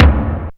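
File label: DRUMTRAKS low tom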